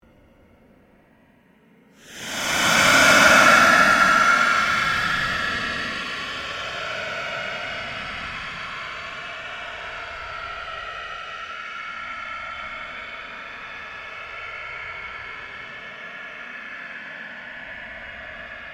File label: surge fade slow, Various sweeps, pulses and drones; Rise And Dive; Rises and Dives